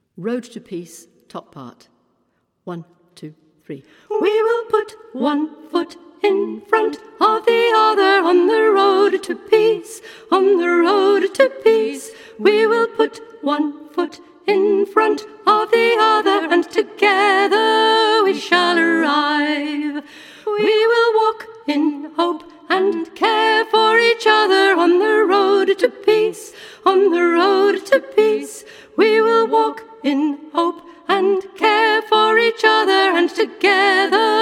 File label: soprano; SAB